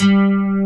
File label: AC GTR, JV 1080; Pro-Rec - Synthsonic